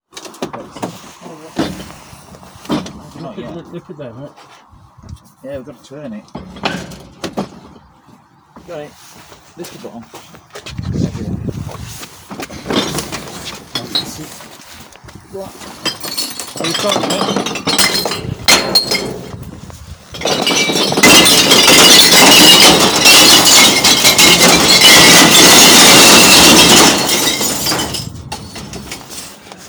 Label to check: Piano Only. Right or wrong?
Wrong